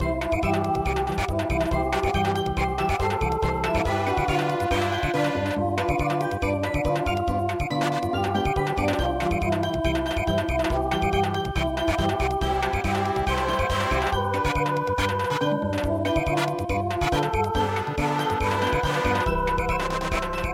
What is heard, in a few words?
Samba